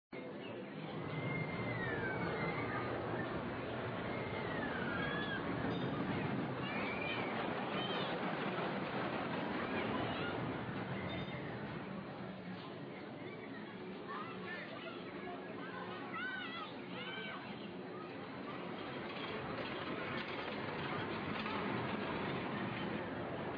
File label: ambience dungeon